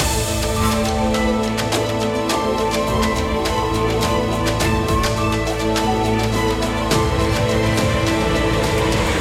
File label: battle; Yotsume God Reunion